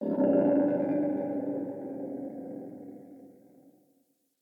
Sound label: Forest Open Glade Creature Far, Day